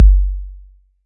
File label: BD 808